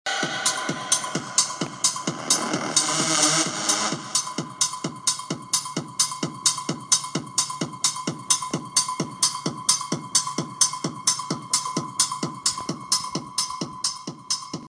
techno

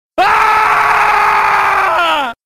fatality on male victim scream; mortal kombat 2

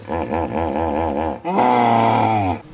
hippo

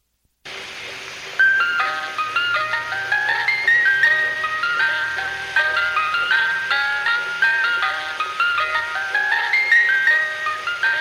ice cream truck sound effect

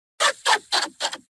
laughter

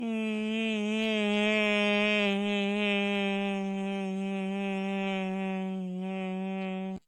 slow laugh

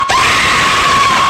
aaaaahh

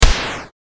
enemy hit

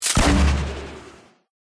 grenade launcher

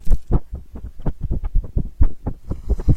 asmr

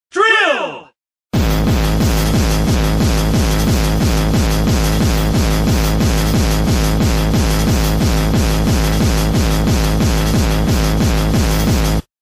drill

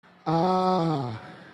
aaaah auto tune

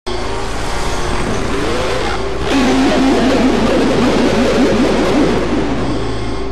scream

